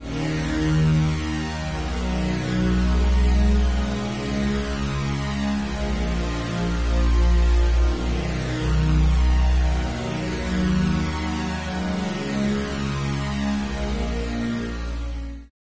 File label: PAD; Synthelium; Somber Time Trip